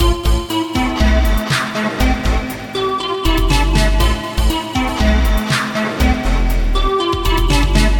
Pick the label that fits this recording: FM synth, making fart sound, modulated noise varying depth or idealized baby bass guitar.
FM synth